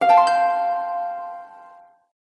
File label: Feature Unlocked; Xbox 360 Dashboard